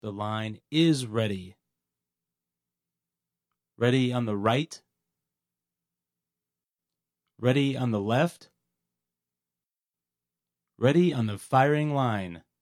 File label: 1 Shot Drill 3 second